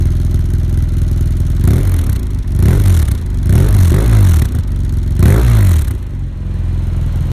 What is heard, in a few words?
F800GS Racing Sound; F800GS - SR Racing Sound 3